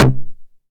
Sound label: 909 SD Tape Sat